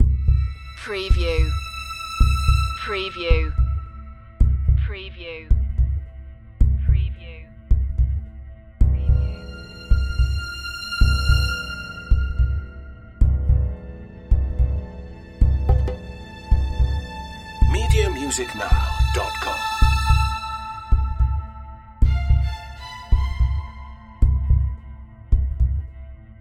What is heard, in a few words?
heart beat nerve loop